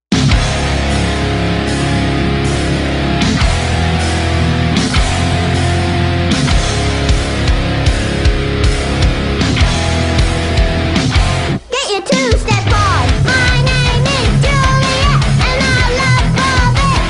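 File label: hardcore song dubstep version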